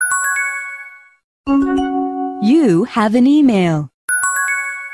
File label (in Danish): glat e-mail